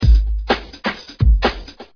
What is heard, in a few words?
funky b, drums